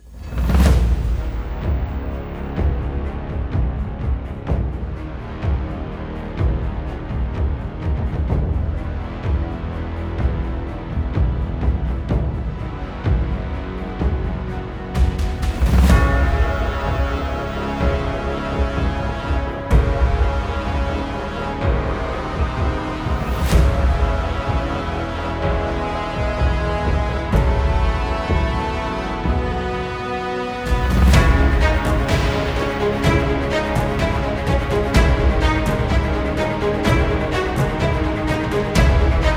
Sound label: Epic Shootout